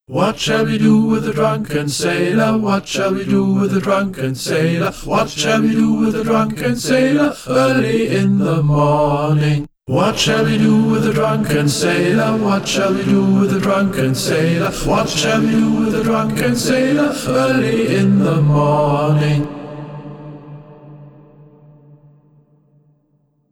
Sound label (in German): 2stimmig